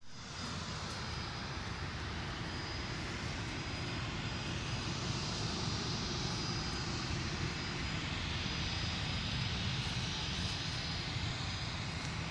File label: Airport Loud